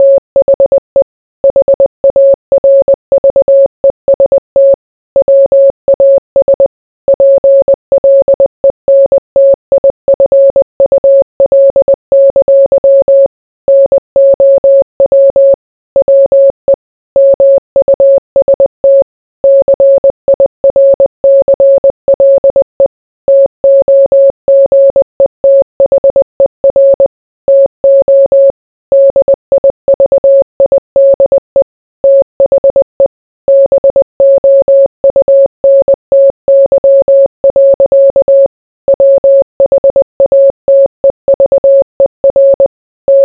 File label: morse